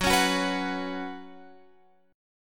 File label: Gb Suspended 4th Gb, down, Guitar, Open F; Gbsus4 strummed